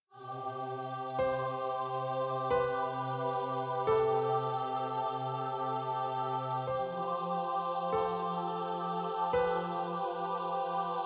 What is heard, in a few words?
Horror